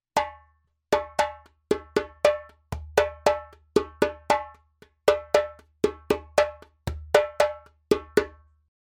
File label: djembe; djembebog oevelse